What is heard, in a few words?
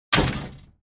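Gym Weight Equipment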